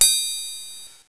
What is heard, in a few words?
Deal Receive